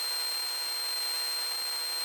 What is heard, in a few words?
Battery Indicator-Machine Broken-Emf Loop